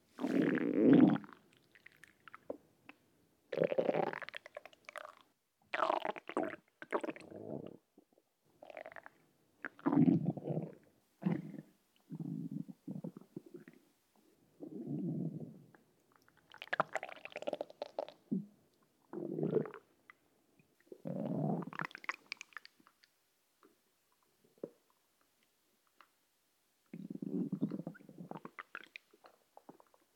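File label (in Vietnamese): Âm thanh đói bụng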